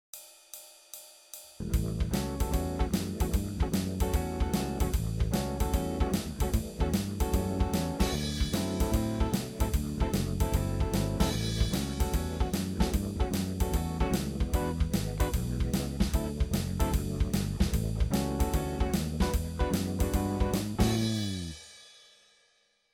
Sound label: backing, blues guitar; john wheatcroft; nailing the changes through blues guitar solos